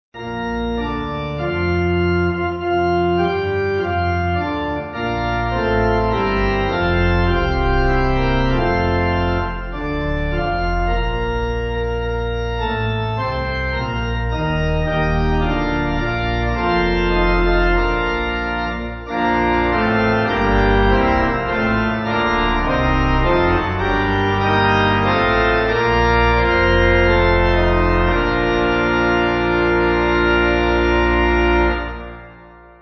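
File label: Pipe, hymn backing; Look Ye Saints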